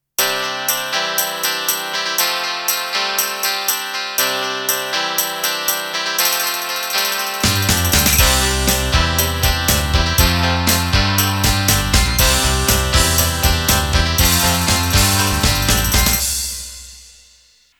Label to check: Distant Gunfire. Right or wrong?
Wrong